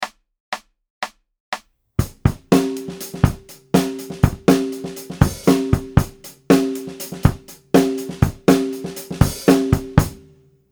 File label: 16th Note Funk; Daniel Glass